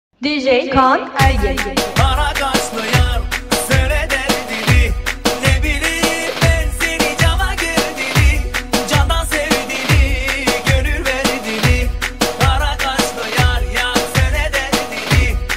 hizli ritim remix

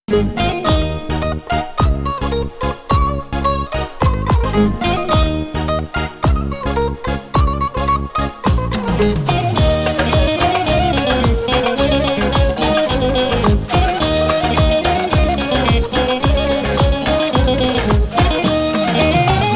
hareketli turkuler oyun havalari; anadolu yoresel turkuler

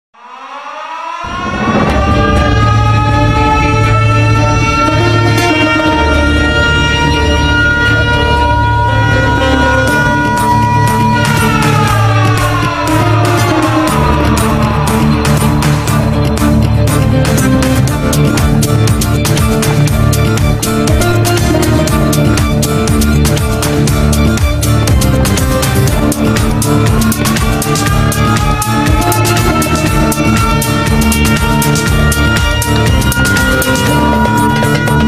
fon muzigi; kertenkele ziya

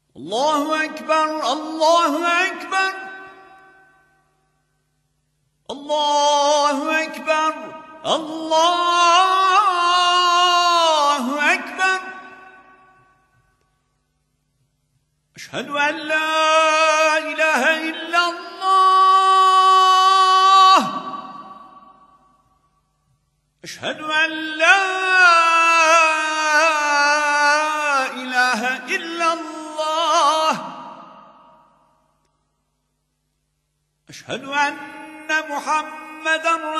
ezan aksam ezani segah makami; Ishak Pasha Palace

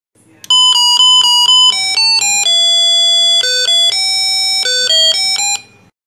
kapi zil sesi

